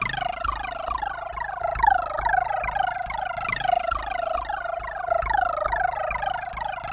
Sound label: Intruder Alert